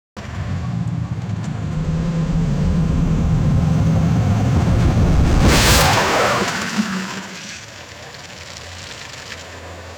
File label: strong engine of big tank